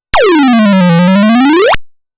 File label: glissando, apple2